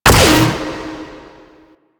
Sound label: Raptor Laser Barrage; Warframe